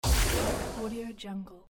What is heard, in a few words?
magic explosion; tibasound